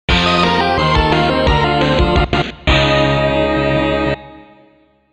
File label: Unknown Fanfare; hot wheels burnin rubber gba